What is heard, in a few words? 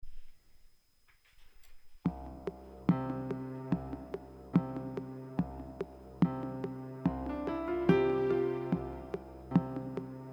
Piano Sorrow